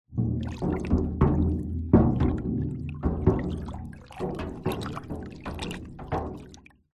industrial water tank wood batton movement stir water in tank light splashes; metal clunks